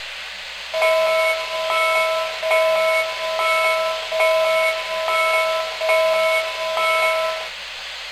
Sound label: Steam Bell Only, train